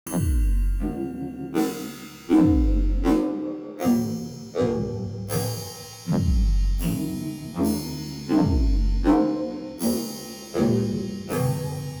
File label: ambient